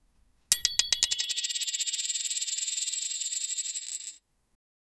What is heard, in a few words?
a dramatic coin flip sound; everyday sounds